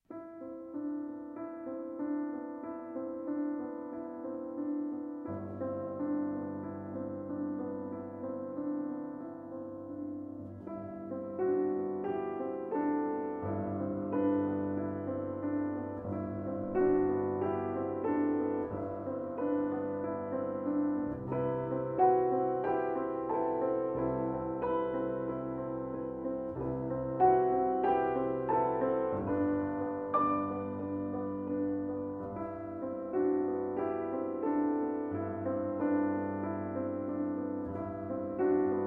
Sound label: Solo Piano